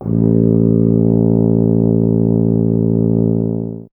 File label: tuba; Miroslav Vitous - Solo Instruments VOL-2